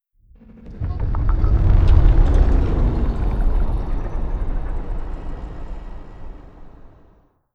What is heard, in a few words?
star eater moving out; Stellaris; nemesis